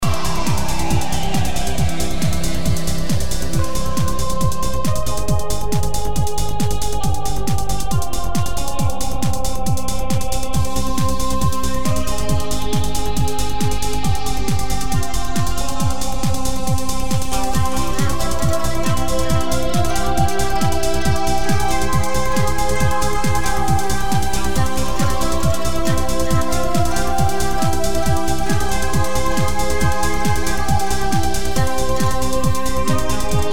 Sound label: Snake Trance Looping